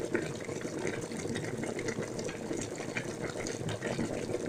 water boiling; 30 cc0 sfx loops

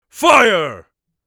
fire, Mage Voice Pack

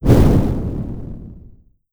explosion; NIIIEMAND's explosion sfx

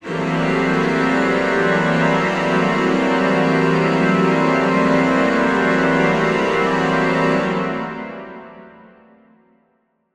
Suspense Strings Mid, horror strings

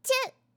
swing, fighting

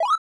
collect, 8 bit sound effects

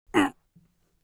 hit, Mage Voice Pack

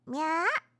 jump, Catgirl Fighting SFX

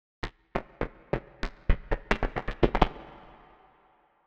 Footsteps - Walk to Run; Horror Sound Pack

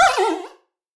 Creature small; Free SFX for anything

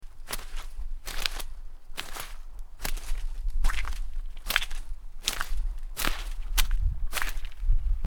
Mud Footsteps; horror; horror sfx vol 2

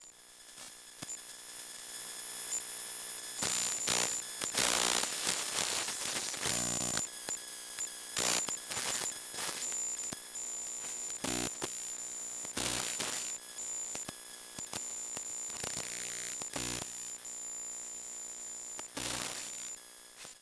Electromagnetic Macbook Sequence; Mono; Essentials Series NOX SOUND

